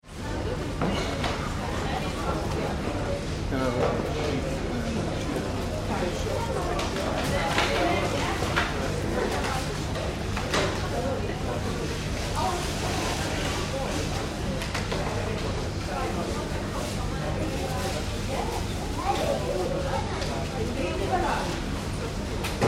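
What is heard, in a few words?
Supermarket Atmosphere - Large supermarket general atmos; chatter - some till noises; Stores And Mall; Tom Hutchings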